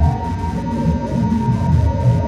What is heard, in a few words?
Swamp Wizard move; SwampOfDarkness